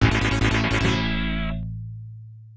Goal In

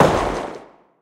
Incorrect

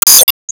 Ball Sub Hit; Disney Star Smash; Android, iOS, Mobile

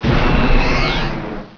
Die; Virus: The Game

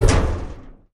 Collision Minimal; lethal company

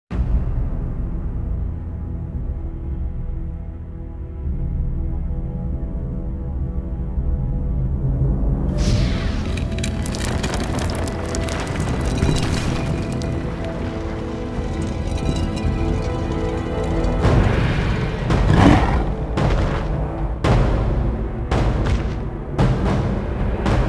lion awake

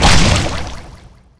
Enemy Poisonwizard Apple Placement; Disney Star Smash; Android, iOS, Mobile

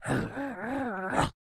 Leap Wind Up; Joblin

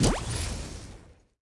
Trials Carousel Page; Subway Surfers City Sound Effects; Android

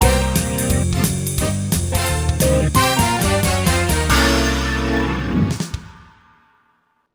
Jingle Failed Bgmusic; Neighbours From Hell Console Edition; GC, Xbox

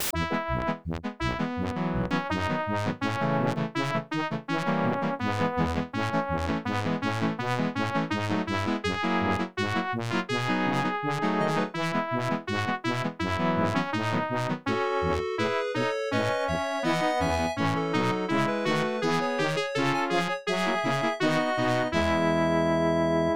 Team Jingle

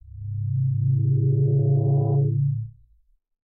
Charge Loop

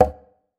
Move Mid

